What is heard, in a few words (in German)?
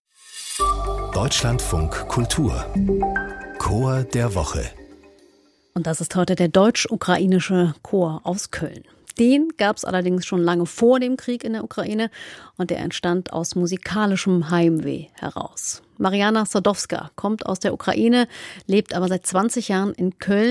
deutsch ukrainischer chor; koeln